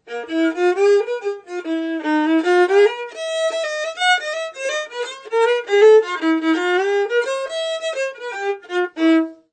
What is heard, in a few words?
phonofiddle, violin; unusual violin